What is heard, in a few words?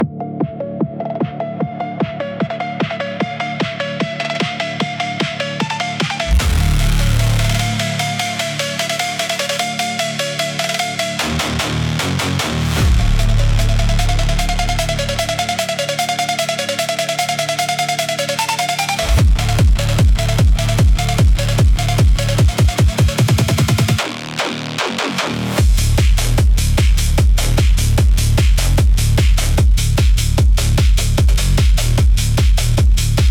150BPM